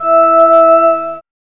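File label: organ tone; X-Static Goldmine 1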